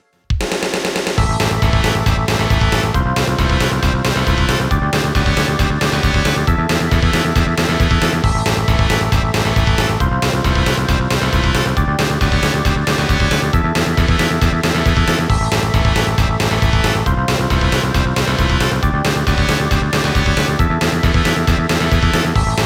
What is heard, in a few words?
Level Up; Shin Megami Tensei Sound Collection